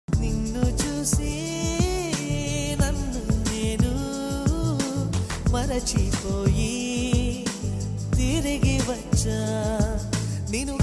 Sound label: telugu love songs; premikularoju